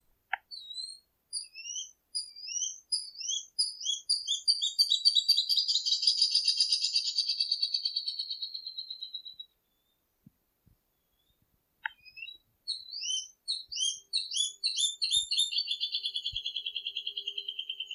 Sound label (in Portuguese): Canto do barbudo rajado